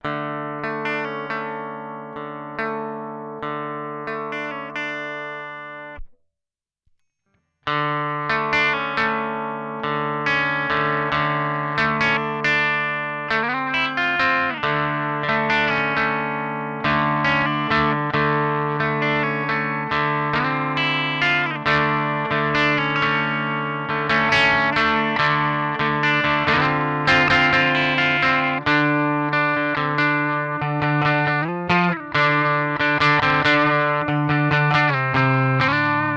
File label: Vox Valvenergy Silk Drive Cab